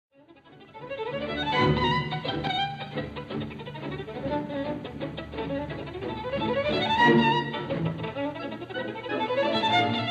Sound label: Violin Concerto in D major Finale Allegro vivacissimo; Tchaikovsky; Op. 35